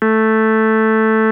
Nord Lead 4 sine FM in square

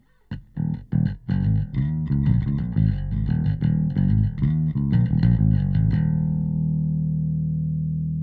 808 Bass; bass preset

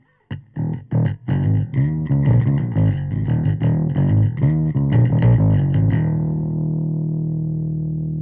bass; Valves

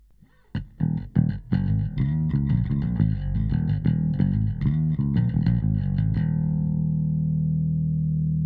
Bass harmonics in out

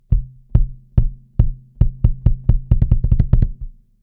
Kick

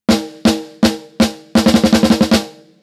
snare Snare Top; AMEK 9099

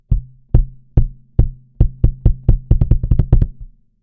kick bypass; VLA-3A

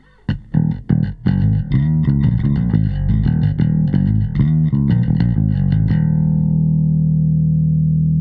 Mu Limiter input Bass

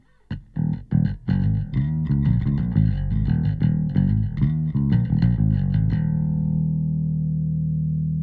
Compressor bass Rock Mids bass

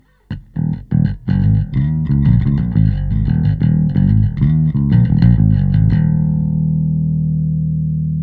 Compressor bass Bass Crush

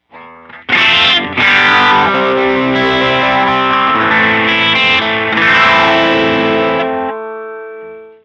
EQ FREQ 296 Hz MORPH Auto

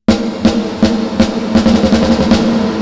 Reverb Snare ShimmerVerb

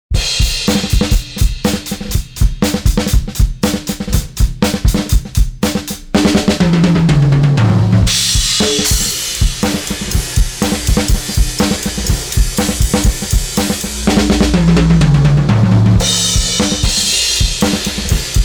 EQ HMF boost; Type G; Kirchhoff

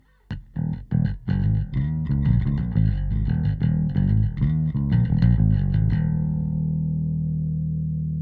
bass; Lindell 50 Series

